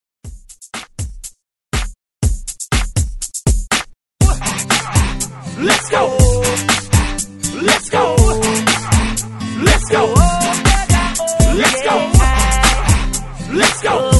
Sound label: Hype Re Drum 121Bpm